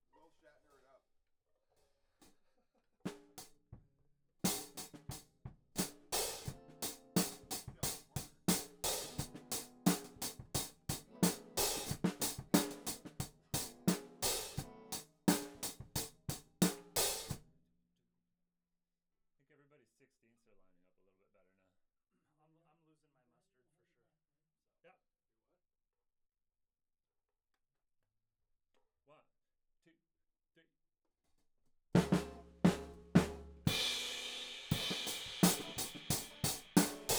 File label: Hat